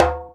djembe; Univers Sons; Basicussions

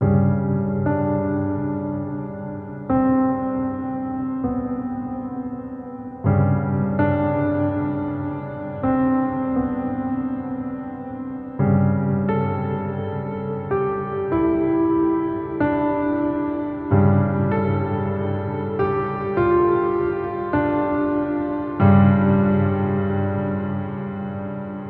Piano Theme; Sonic Foundry (Sony Creative Software); Numina II

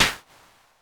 rim, Roland MC-909

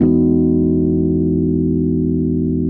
RHODES; Houseworx

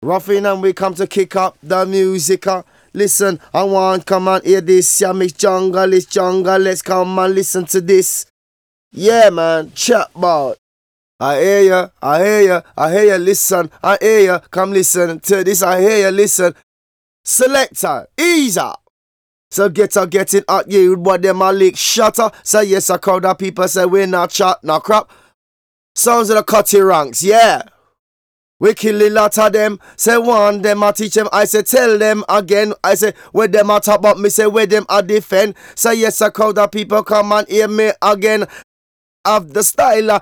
breaks, Jungle; Zero-G Jungle Frenzy 1

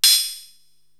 HH Trihat Open, Korg TR-Rack drum machine

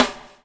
BUBL, BUBBLE; Spectrasonics - Backbeat

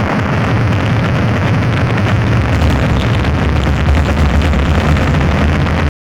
WAR FX; Hard & Loud Techno; USB Soundscan vol.01; AKAI

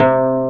harp C2; E-MU Formula 4000 Series Vol. 5; Protozoa; Proteus2 Presets

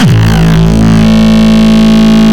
Longkick, kicks

